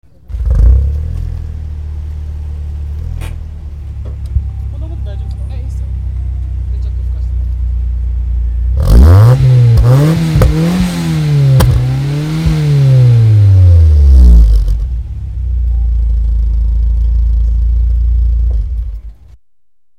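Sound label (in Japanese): Trust BL, 111BZ-R+TRUST BL レーシング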